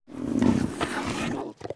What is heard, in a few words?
NEO WAR BEAST PEACE IDLE